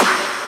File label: VEE Percussion; Percussion Instruments